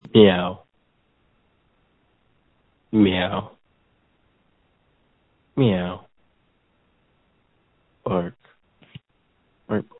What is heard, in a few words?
Scream